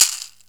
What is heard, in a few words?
AFRO CBSA, CABASA; AKAI S-Series CD-ROM Sound Library VOL-5